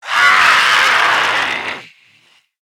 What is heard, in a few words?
die, zomb kid; nmr